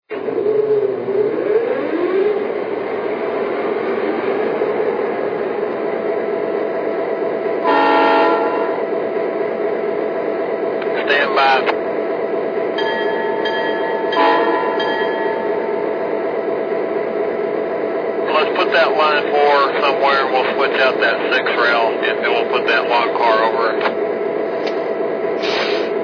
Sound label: MP15, electric trains